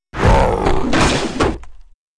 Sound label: attack